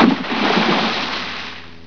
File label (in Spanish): AGUA